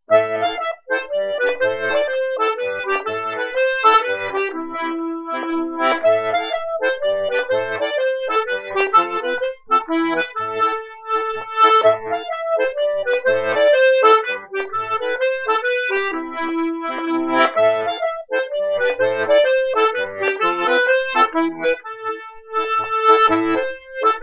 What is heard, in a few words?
Polska